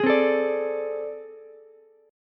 A Minor Major 11th, down, Ukulele; strummed; AmM11; JGuitar

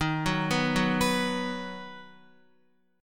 Eb Minor Sharp 5th, Guitar, Ebm#5 chord; arpeggiated; JGuitar

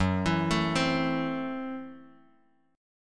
Gb Minor 7th Flat 5th Gb Guitar DADGAD; 4,7,7,5,x,x; down; chord shape

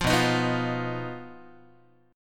B Major Flat 5th B down Guitar; BMb5; strummed; 8,7,6,9,9,x

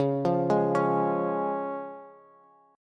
C sharp Major, Bass; arpeggiated; 21,20,18,18; JGuitar

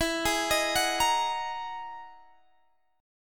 E Major Sharp 11th, Guitar, Drop D; chord shape

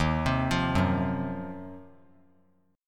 Eb Minor 6th Add 9th Eb down 3C,3F,3A,3D; arpeggiated

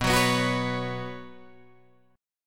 B Suspended 2nd, Guitar, Drop D

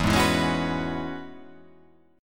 E 6th Add 9th E down

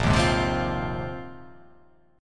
Bb 9th Suspended 4th Bb down, Bb9sus4 chord; strummed; JGuitar

